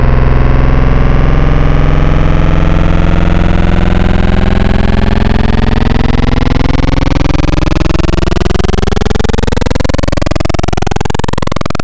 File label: Analog PWM Custom, wavetable